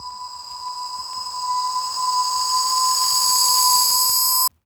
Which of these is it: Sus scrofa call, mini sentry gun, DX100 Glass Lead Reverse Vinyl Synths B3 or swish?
DX100 Glass Lead Reverse Vinyl Synths B3